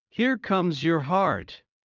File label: Heart low; Classroom humanbody